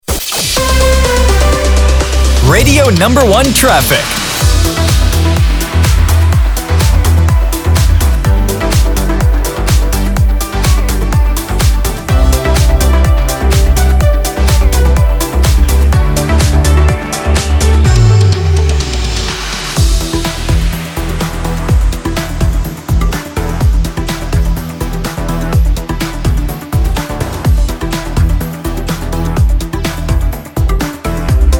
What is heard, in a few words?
Traffic, jinglepacks